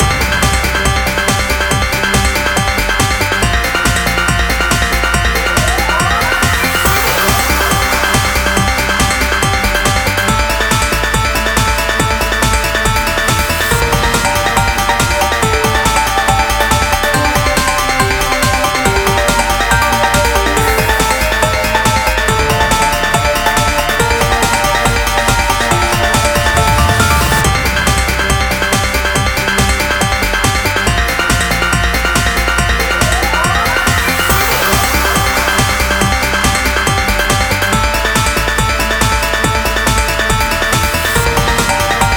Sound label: Factory Battle; Gocco of War